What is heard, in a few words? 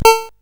Buggy Boy, CSG8580R5